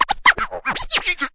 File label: everybody, Scratching and Turntablism; DRUM LOOPS and BREAKS